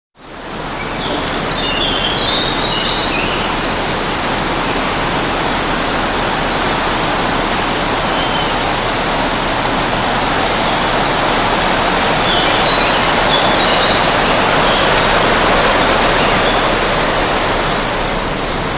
Strong Wind In Trees; Strong Wind Rushes Through Tall Trees In Forest With Spacious Birdsong